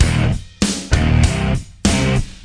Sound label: 098 hard rokker, sampled music loop; SAMPLED MUSIC LOOPS